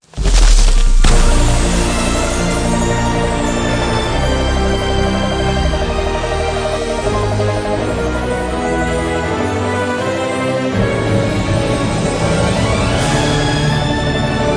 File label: super Mega Win; fairyhansel